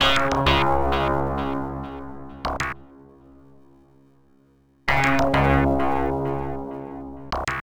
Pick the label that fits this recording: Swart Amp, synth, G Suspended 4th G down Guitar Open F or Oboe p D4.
synth